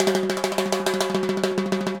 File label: Timbales H Roll, Latin Percussion; Drum Hits; Ableton; Factory Packs